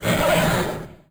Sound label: skeleton knight cry; Crypt of the NecroDancer